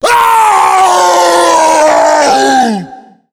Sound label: tank fire, voice pain